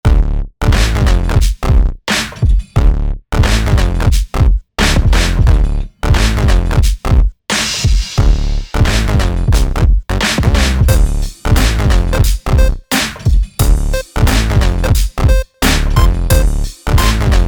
bass is kickin; weeklybeats 2024

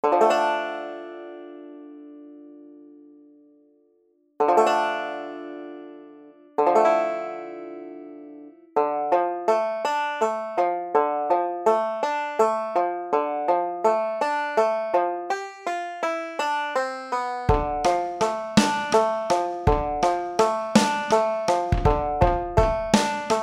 banjo thing; weekly beats 2020

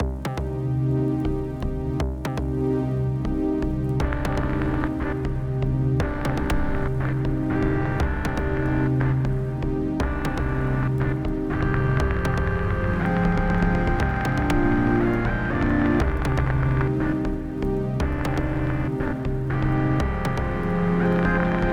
dark brass tiptoe; weeklybeats 2024

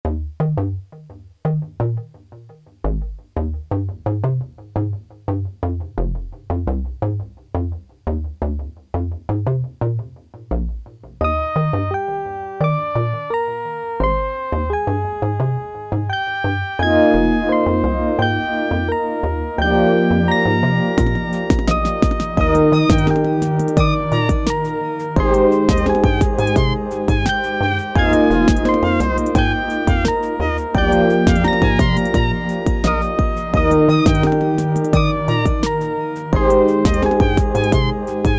menu music for menus

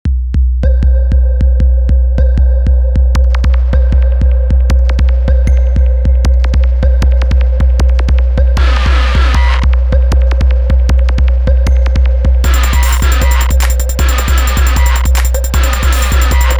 155bpm; cybernetic manicure

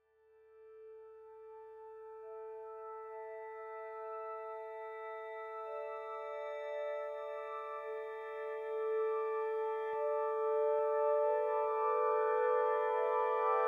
digitone ambience; weeklybeats-2018

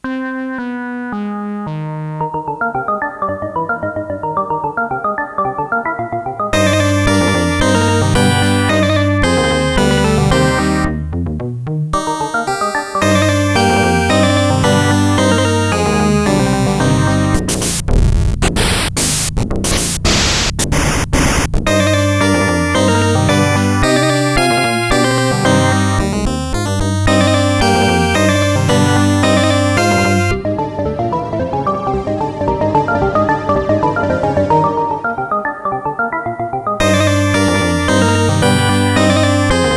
riffs; weeklybeats-2016

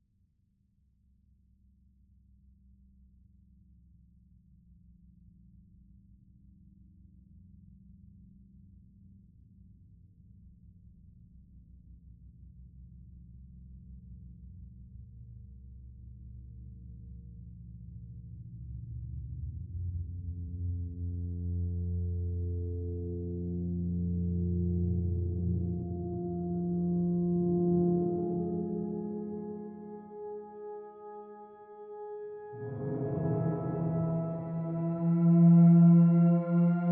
soundscape; baradonnea; weeklybeats 2014

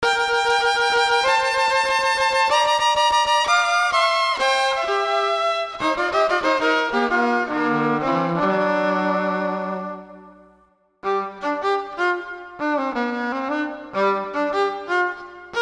random violin

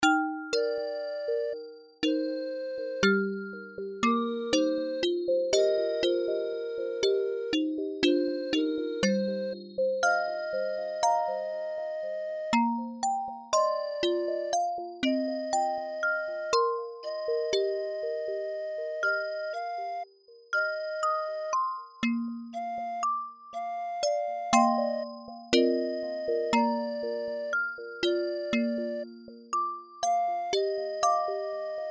music box

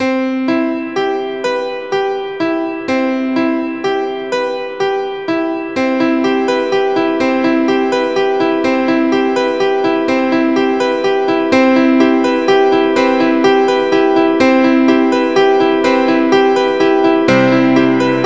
piano stuff; weeklybeats-2016

